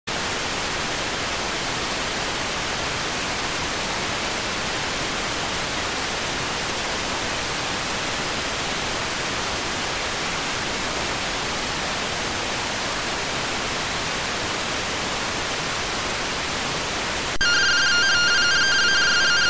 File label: rtty rx